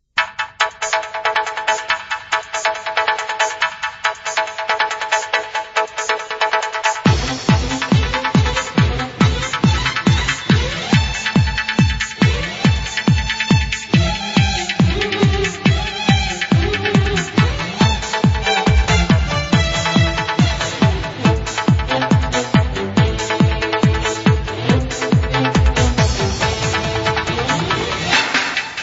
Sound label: arabic; haifa